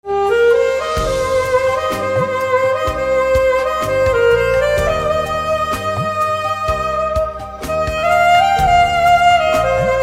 Saxophone Instrumental Ringtone

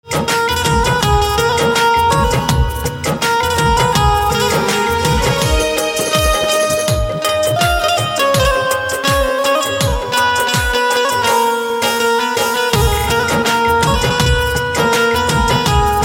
Banjo Instrumental Ringtone

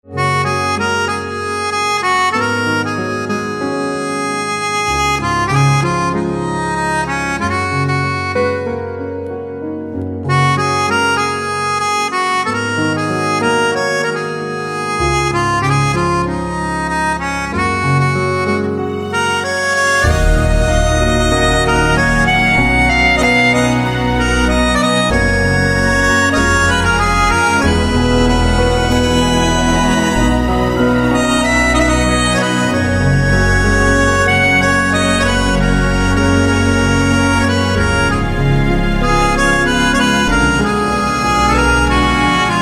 Pianica Instrumental Ringtone

